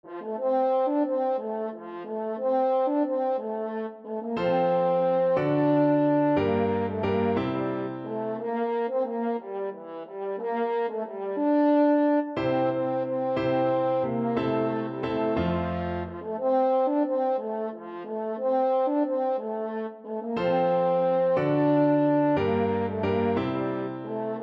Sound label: blow the man down, french horn